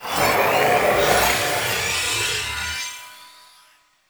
Vassal Laser Charge; Enemy; Characters; Abilities; Mendicant; Mnd King

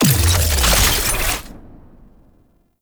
Wrist Gun Transform; Weapons; Warframe; Tenno

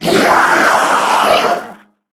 Death; Warframe; Corpus Amalgam Sniper; Dialog Barks Battle

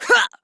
Pain, Female; Warframe; Modular Corpus Female; Battle Barks; en

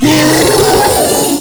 Proto Infested Ancient Vocal Attack; Warframe

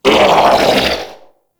Scream; Warframe; Amalgam Override; Corpus

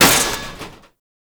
Electronics Misc Destroy; Warframe; Ambience; NineteenNinetyNine

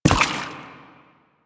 Step Water, Footsteps; Lasrian Butcher; Lasrian Soldiers; Foley

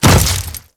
Grineer Step Flesh, Footsteps; Enemies; Warframe

